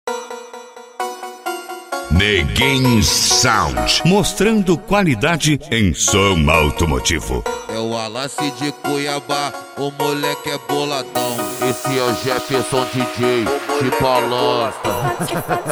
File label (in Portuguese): summer eletro hits mais funk